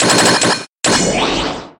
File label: Ball Break; Smash